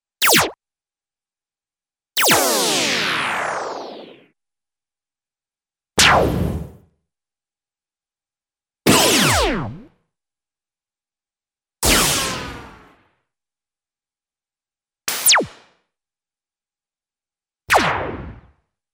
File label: Laser Zaps - shots, Cartoon; Hollywood Edge; Cartoon Trax